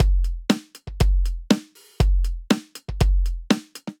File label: Roto Kit, Drum Kits; Ableton; Drum Booth